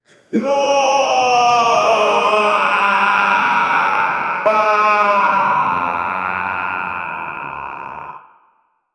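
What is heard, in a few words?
sinister infernal male laugh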